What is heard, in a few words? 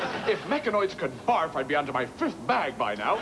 barf